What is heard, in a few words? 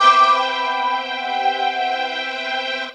Dream Pad (Min7th)